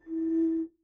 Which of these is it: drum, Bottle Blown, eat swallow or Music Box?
Bottle Blown